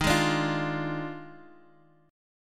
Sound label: D sharp Major 7th Suspended 2nd Suspended 4th; strummed; x,x,1,1,3,1; JGuitar